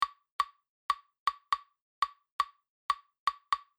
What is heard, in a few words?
Rumba Fast